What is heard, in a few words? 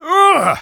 Spy taunt flip exert; «Уух!»; Насмешки шпиона